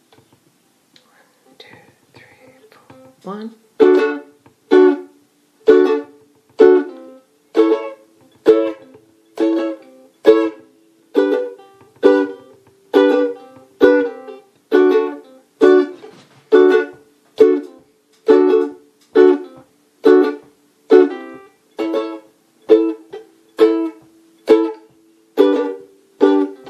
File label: reggae strum, ukulele